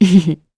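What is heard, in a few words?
Vox Laugh, Korean; Glenwys; kingsraid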